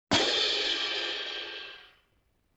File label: LoFi Cymbal Crash; Vintage Cartoon; Drums & Percussion